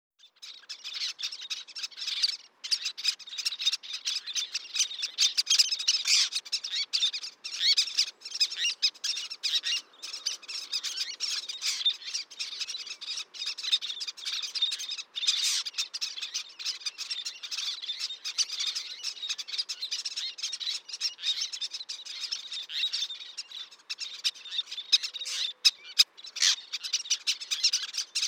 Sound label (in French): Petite sterne Least Tern, chants des oiseaux; Chants des oiseaux de la Guadeloupe